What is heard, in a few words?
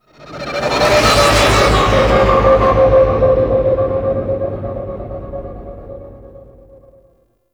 Fly By Slow; Vehicles; Warframe; Liset; Nora Liset